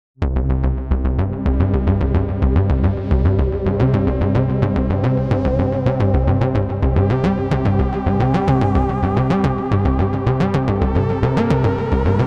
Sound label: pad arp old school style; Lush-101